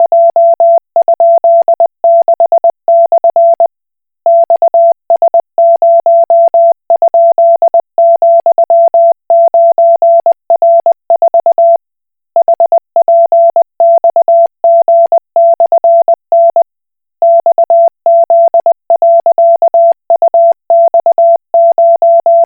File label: Koch Morse